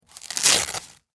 Sphygmomanometer, Remove, From, Arm, Velcro, Blood, Pressure; Emergency; Hospital; BlastWave